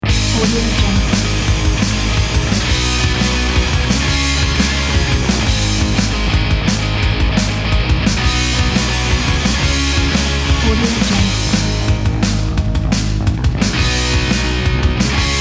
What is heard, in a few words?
rocking out; maleo113